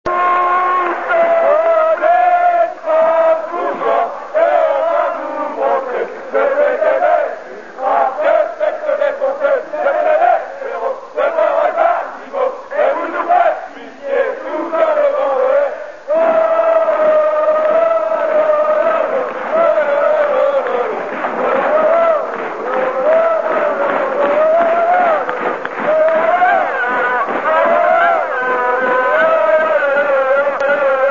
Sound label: Chants, Strasbourg